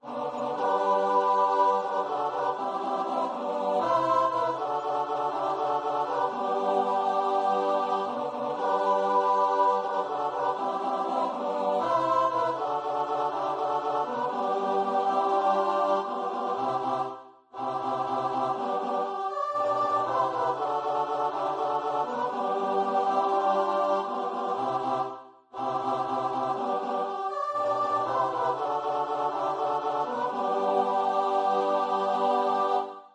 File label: CHOIR